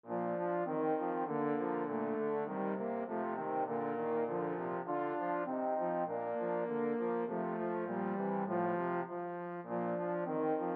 schumann melody, trombone trio

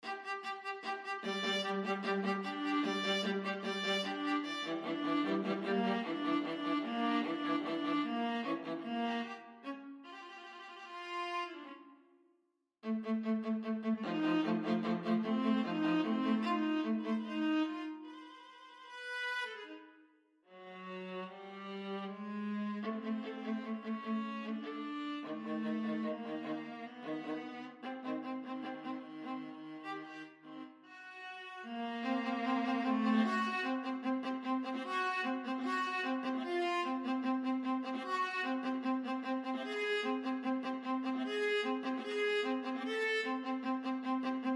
viola duet; poules

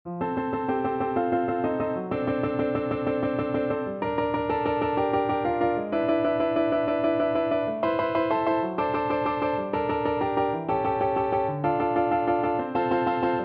French Horn; Schumann, Robert - Frulingsnacht from Liederkreis Op.39

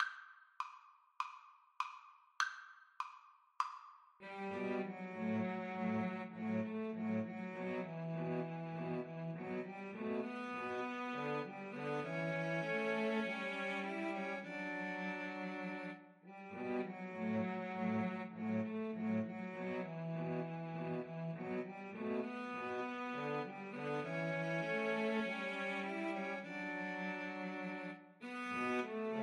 Cello trio

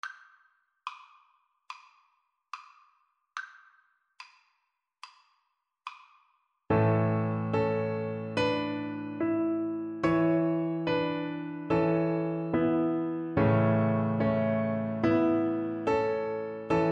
ode to joy, Viola

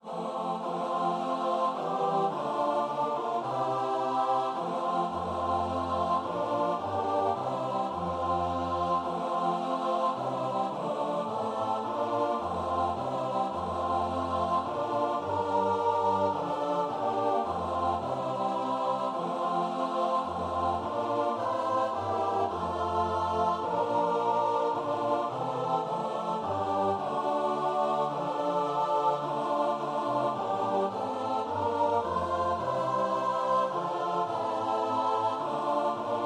sighing cease, choir

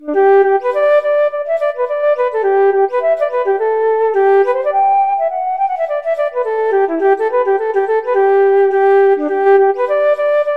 flute

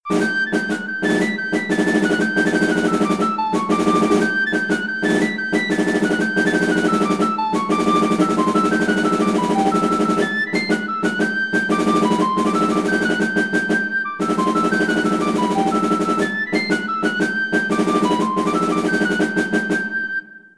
flute or piccolo and drum

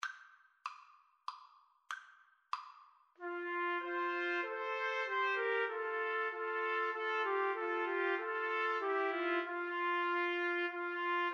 TPT trio, Trumpet Trio

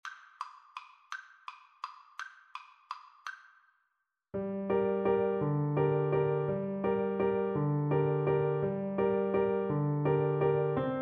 clarinet

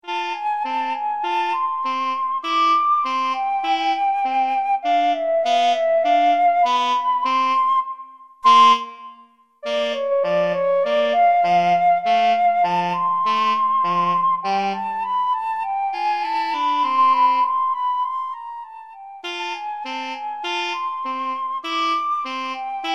flute sax, flute-saxophone duet

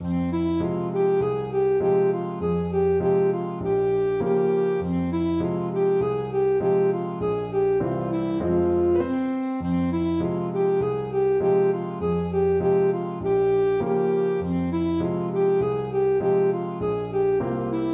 ASAX, saxophone

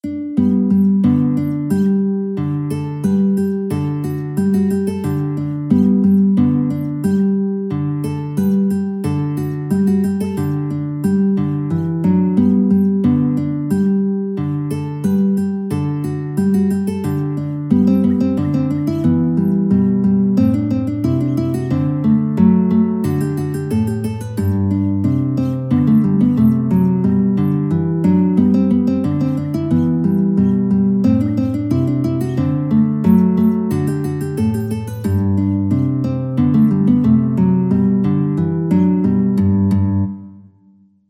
guitar

